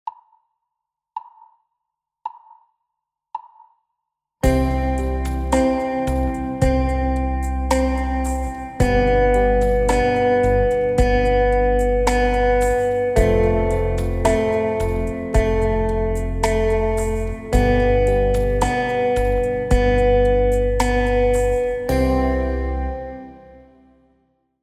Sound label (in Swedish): Fjärdedelsnoter